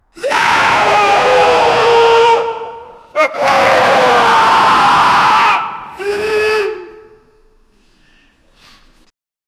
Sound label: running footsteps people